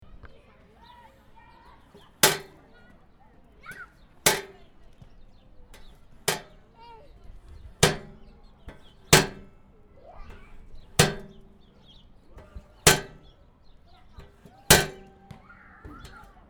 poubelle square

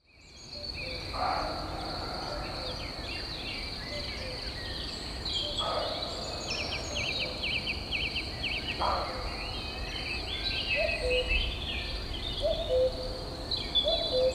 grillons coucou chevreuils; vues lumiere

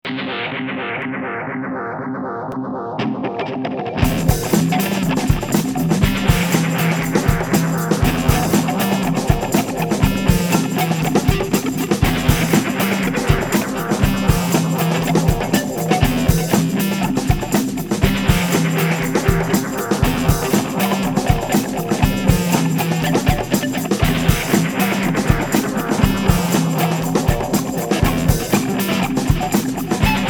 canot a l eau

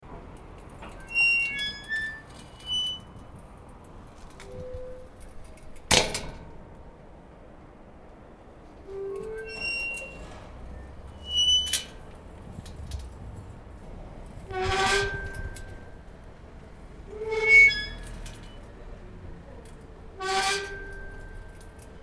portail couinement

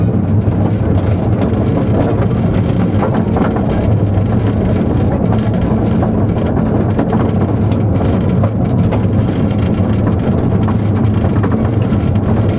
Train jaune; MZ-N710; Messeix

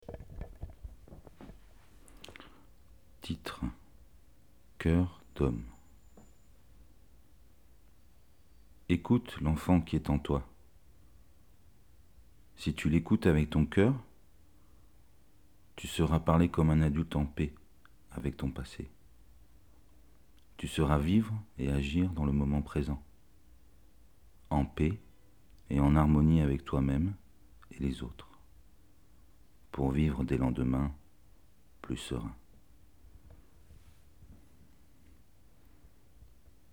coeur d homme

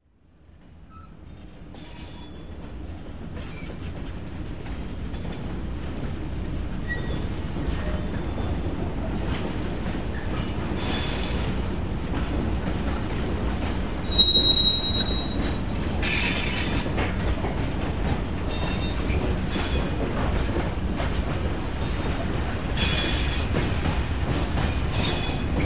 triage amberieu

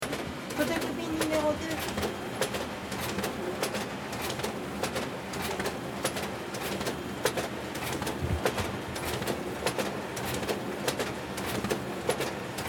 photocopieuse

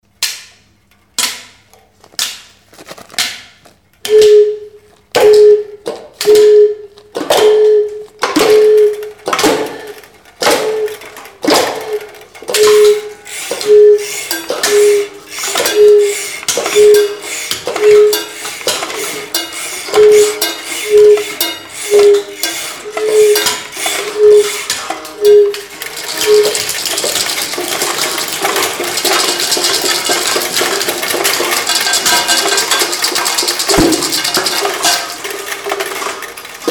mini orchestre

